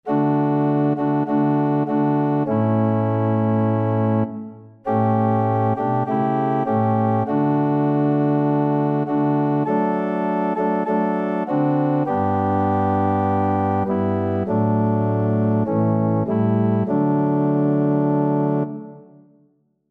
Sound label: organ